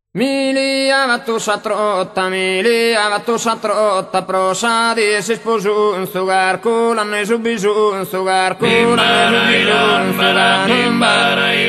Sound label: Tenores - Ballu lestru